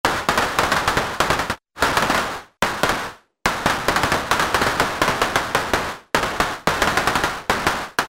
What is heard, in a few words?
Gunplay, Alesis airSynth